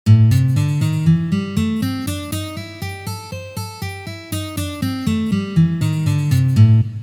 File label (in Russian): Blyuzovye gammy Lya minor